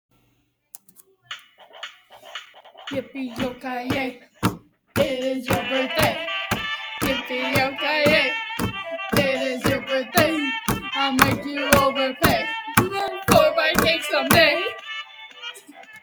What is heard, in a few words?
yippy birthday